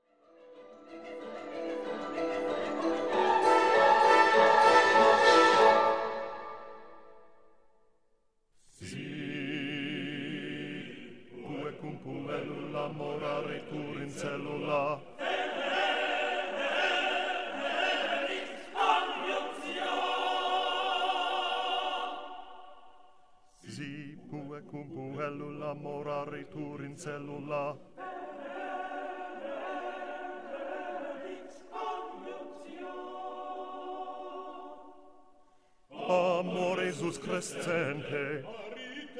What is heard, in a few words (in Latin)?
Si puer cum puellula Bass slow